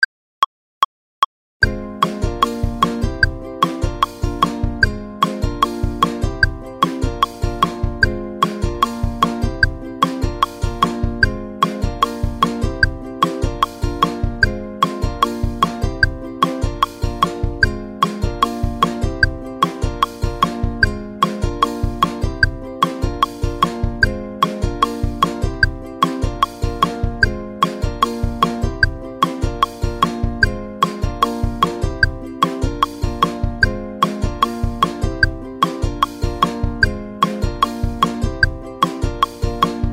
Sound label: Happy Strumming Verse Full Tempo